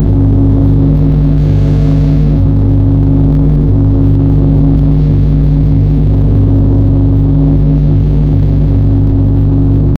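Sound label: an electronic roomba moving, wheels spinning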